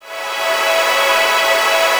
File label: PAD, Drum & Bass, 160 BPM